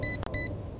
spinner spin; Clicking Head

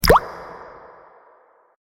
menu hit; AriizuCA's Skin V3.17.6 Tail Yellow

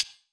soft slider tick; AriizuCA's Skin V3.17.6 Tail Yellow

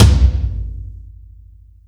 drum hitfinish; izduh v4 blue simple

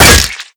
menu freeplay click; Clicking Head

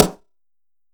drum hit normal; Crimson Stella

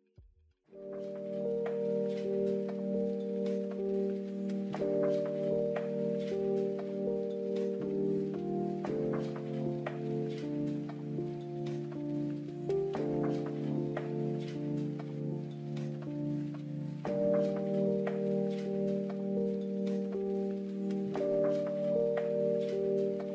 pause loop; Graytech 1.1

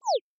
pause back click; 《CK》 WhiteCat 3.0 ~ DT

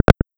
menu back

